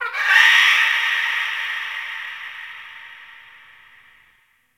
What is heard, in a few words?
Ocean Underwater Creatures Low Medium; Ambience; Warframe; Grineer Ocean